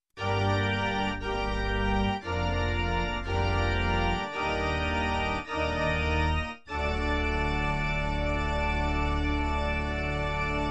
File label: Descending Organ Chords